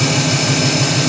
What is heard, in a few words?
ENGINE IDLE